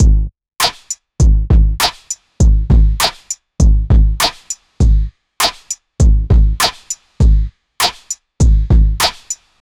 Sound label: hiphop